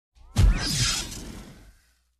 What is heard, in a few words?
Engine failure shock